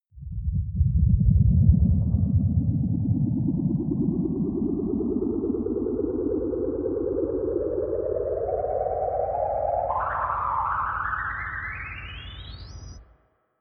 ufo take off wobble long

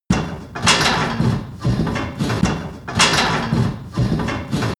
industry machine mechanic metal low

